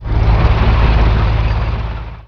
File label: inkvine engine; Emperor - Battle for Dune